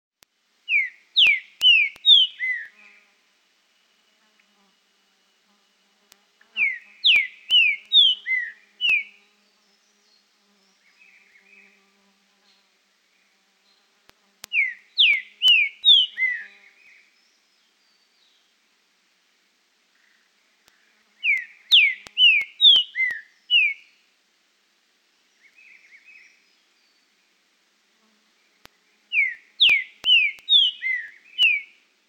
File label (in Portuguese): trinca ferro, fauna